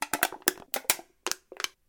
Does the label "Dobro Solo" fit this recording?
No